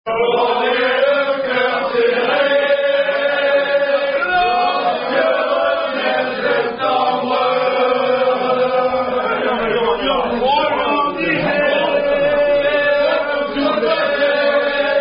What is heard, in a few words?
3 French Rugby Songs; Unis Pour Gagner